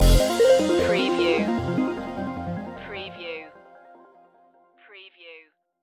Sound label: information age stinger